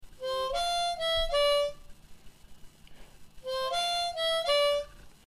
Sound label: harmonica sample